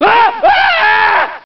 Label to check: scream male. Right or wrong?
Right